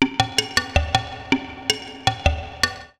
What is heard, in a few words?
PERCUSSN, Ambient, Drum